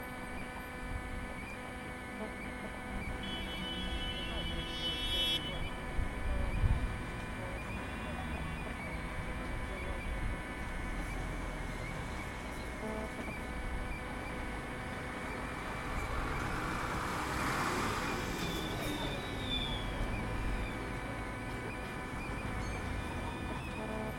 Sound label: Electronic Noise In The City